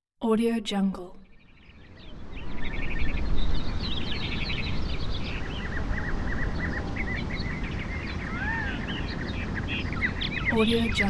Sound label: prairie ambience; cape audio